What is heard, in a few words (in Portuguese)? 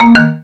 usb efeito sonoro